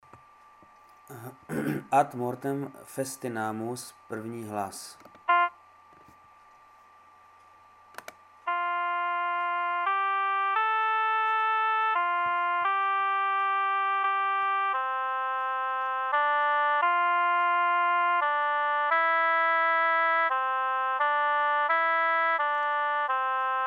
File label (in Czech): ad mortem festinamus 1 hlas